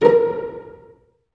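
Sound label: string section, orchestra; Roland - LA Composer vol. 1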